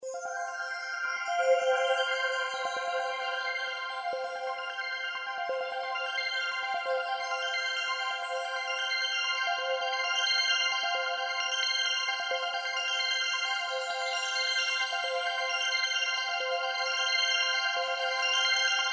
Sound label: Scan Success; Littlest Pet Shop Your World